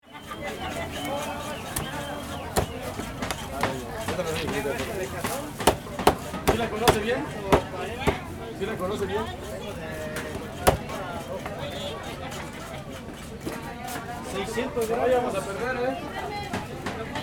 Busy fish market atmosphere with crowd

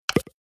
Pop up bubble notification

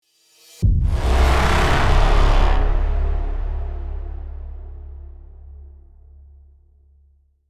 Cinematic braam hit impact; transition

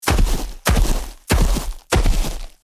Velociraptor dinosaur footsteps slow

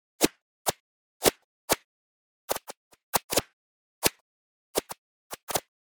Paintball field ambience flying paintballs

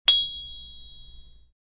Metal hit and ringing

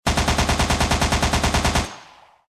Machine gun burst distant perspective

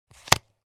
Playing cards poker blackjack dealing single card

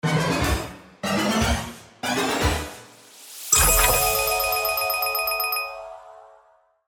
Slot machine casino big reveal reel start

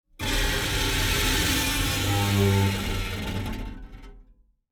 Metal wires tortured texture

